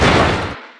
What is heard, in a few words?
Car Crash; Hot Rod